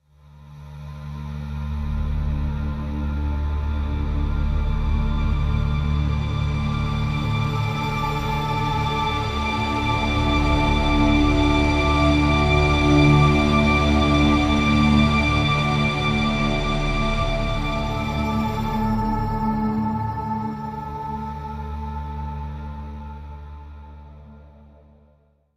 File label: Snowstorm; Assassin's Creed III